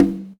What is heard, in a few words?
Conga; Equipped Music; Loungin House